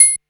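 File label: Axxe hi-hat closed, Drum Machines, ARP Axxe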